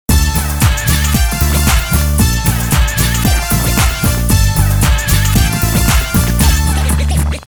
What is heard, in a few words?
brass